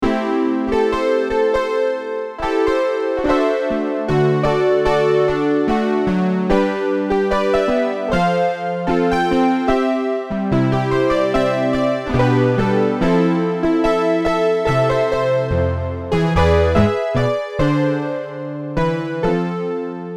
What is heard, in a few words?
P8 Grand, Prophet '08